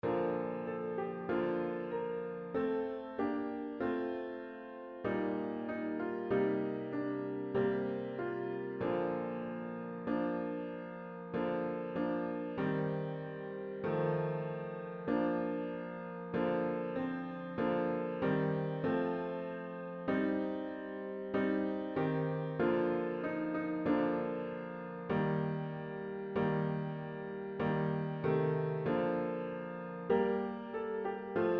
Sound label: venturing out accomp without solo